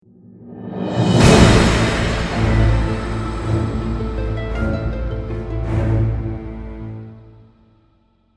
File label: battle end; netease